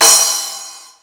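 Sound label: Splash, Yamaha SY22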